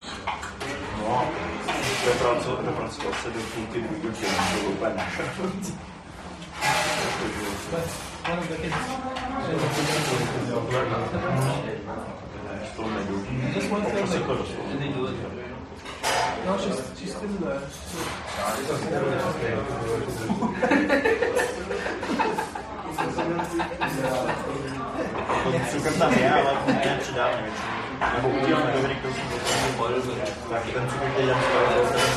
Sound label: prague interior indian restaurant medium crowd walla eating food heavy coughing; metal plate